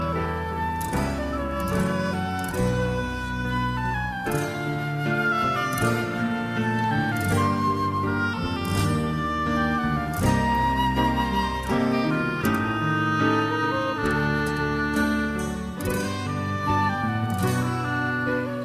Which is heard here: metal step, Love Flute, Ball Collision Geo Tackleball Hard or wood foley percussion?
Love Flute